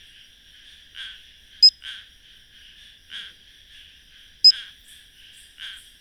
Stumpffia huwei

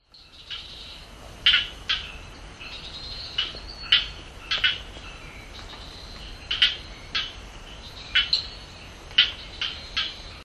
Gephyromantis tandroka

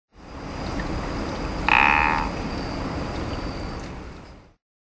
Mantidactylus bellyi

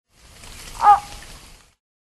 Plethodontohyla alluaudi

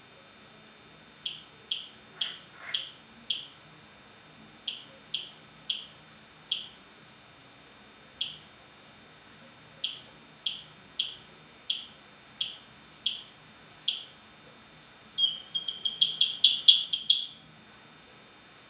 Pseudophilautus zorro

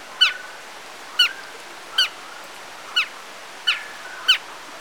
Nyctibatrachus humayuni

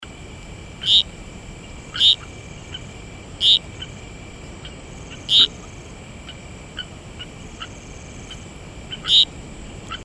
Heterixalus betsileo

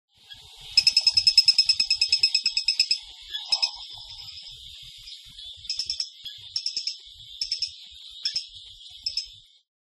Blommersia wittei

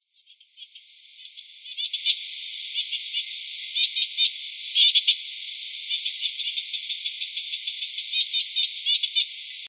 Heterixalus variabilis

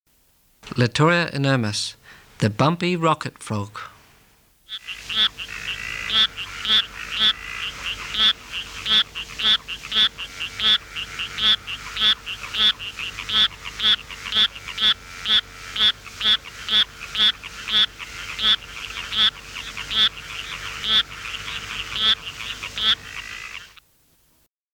Litoria inermis

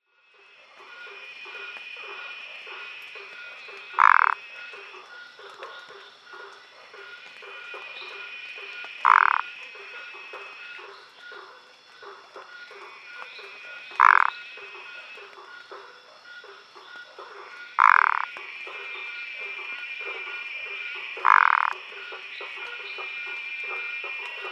Phyllomedusa burmeisteri

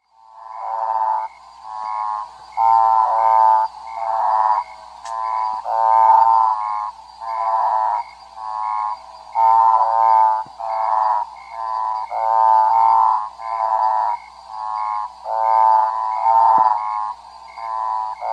Odontophrynus americanus

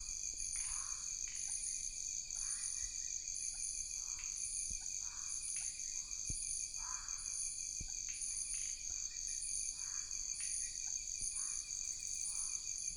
Rhacophorus rhodopus

